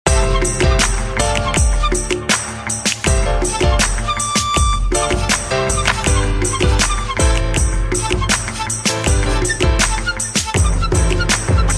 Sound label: wake up alarm